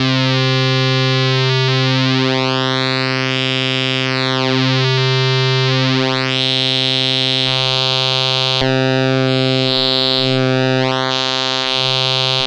PWM mit allen Wellenformen

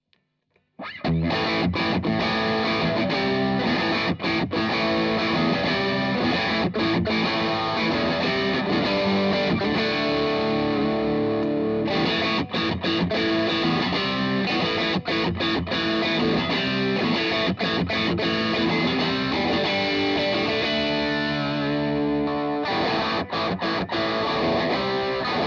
Riffing und Solo

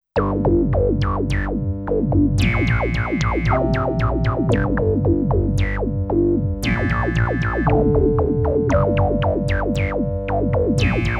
DM12 Klein und Niedlich

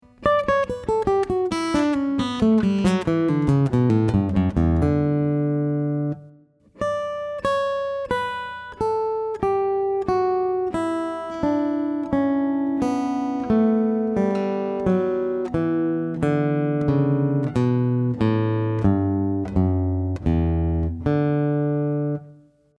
D Dur akk.

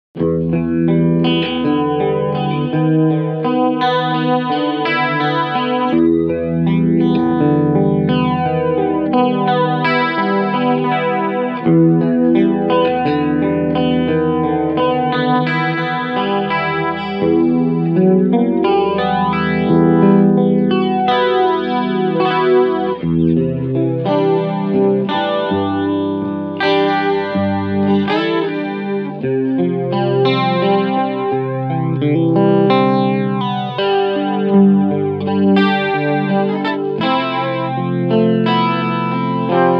Flanger Blend 2 Uhr Rate 9 Uhr Intensity 4 Uhr Tape ON Clean; JHS Pedals 3 Series

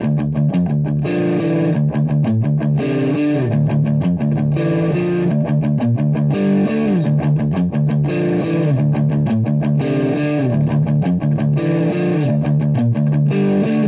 Verzerrer Pedale Mid Gain; Plumes

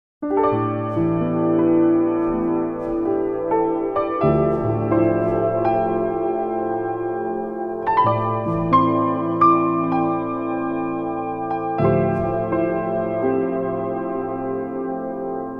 Klavier; Adaptiverb

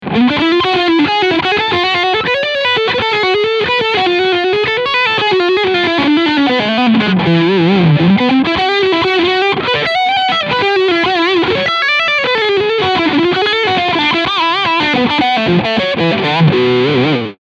Traveler Guitar TR VAIBRANT Overdrive Lick Steg Pickup

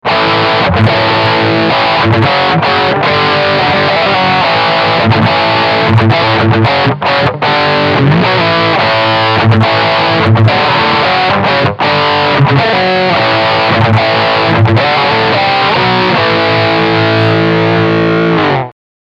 Riff Steg HB1

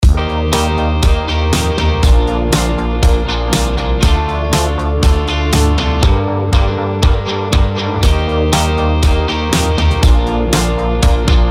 Beispiel Song Master Rebalance leiserer Bass

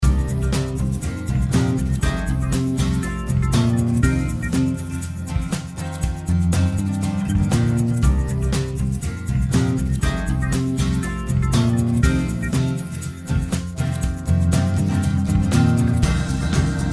iZotope rx8 song ohne vox

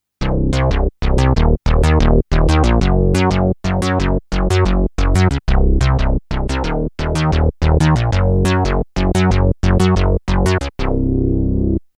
Reso Bass Unison 4 Pol Lautstaerke kompensiert; Curtis

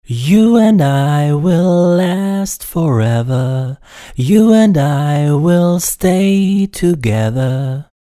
Gesangsspur Hard Auto Tune Effect

